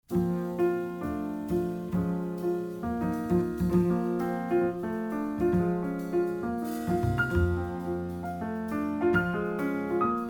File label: piano trio